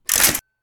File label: Weapon Reloading